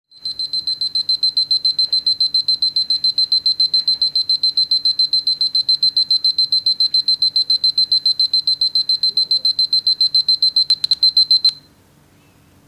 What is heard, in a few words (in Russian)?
pischit budilnik